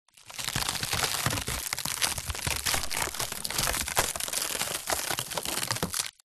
impacts wood collapse break splinter

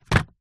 business cards in card box set down

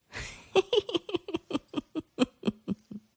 human FEMALE subtle laugh chuckle

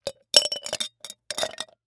food drink ice into martini shaker; martinimeniscus

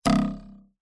cartoon twang spring ruler

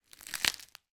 leaves rip tear; foley

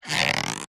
cartoon stretch pull elastic

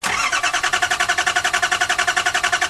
vehicles car suv diesel false start front ext; nissan patrol

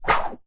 rubber cord whip through air whoosh; foley; zapsplat

